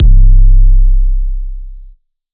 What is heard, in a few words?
808 Tape; Kryptic Samples